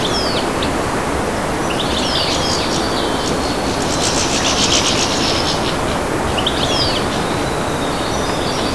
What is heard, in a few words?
Goldfinch